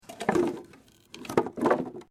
WOOD PLANK MOVEMENT; Material components for constructing more complex sounds; Materials

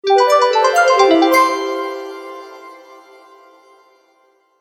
News Message, Cartoon

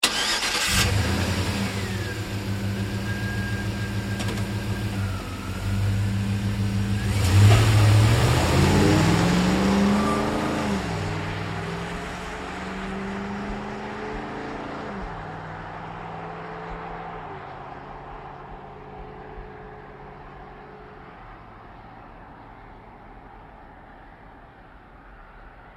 Truck GMC Savana Start Away Med; 2010 GMC Savana; Trucks; Vehicles

